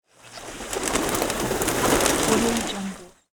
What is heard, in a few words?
flock of birds fly away